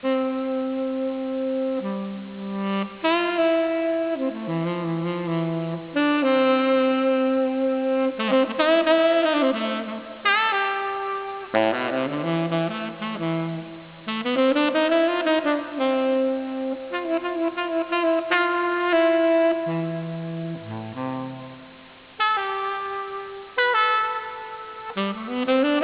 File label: Saxophone; i-mobile; PANO-DC5210